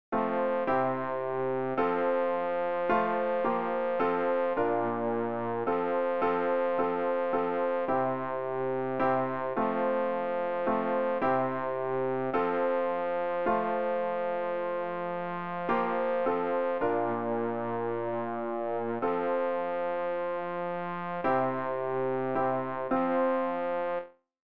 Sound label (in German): bass; Ref. Gesangbuch; RG 585: Gedenke unser